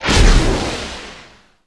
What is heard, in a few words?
Toss Clam